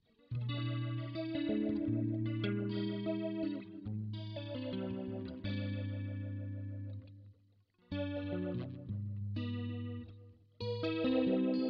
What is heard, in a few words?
Jazz Chorus